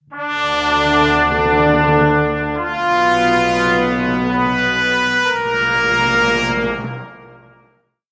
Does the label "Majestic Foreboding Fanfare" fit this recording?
Yes